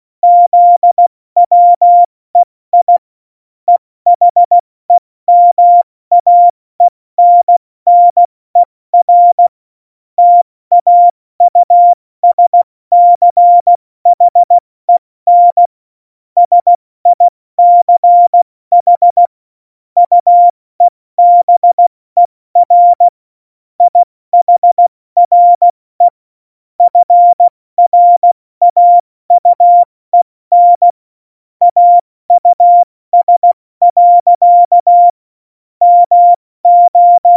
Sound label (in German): Witz, Morsekurs, 70 F80; Witze